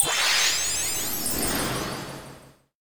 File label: magic reverse